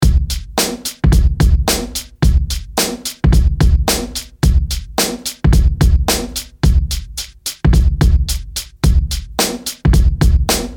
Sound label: 80s Re Drum 108 Bpm